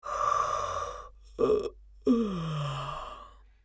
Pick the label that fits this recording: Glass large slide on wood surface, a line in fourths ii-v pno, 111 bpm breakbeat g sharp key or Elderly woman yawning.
Elderly woman yawning